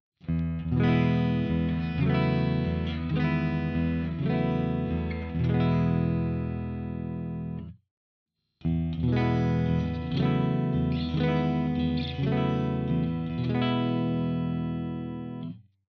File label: clean chords all positions; Jackson PRO PLUS Solist SLA3